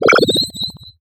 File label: Animation Cartoon; Multimedia Internet CD-Rom Flash